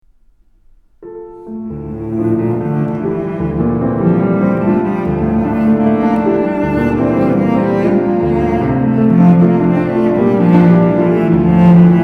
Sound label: Cello Sonata Allegro